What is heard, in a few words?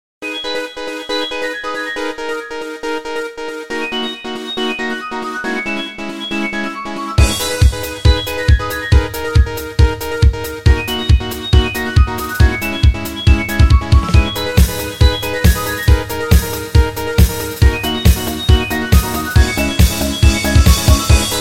humming bird ringtone; nokia